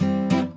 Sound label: Guitar Riff; Levels Hub Swamp 01 Music Ray